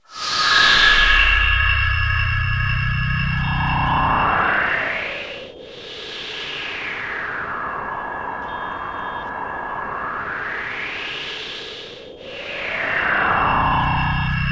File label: alien; Optical Media International; Sonic Images Library; SI FX Vol 21; Instrums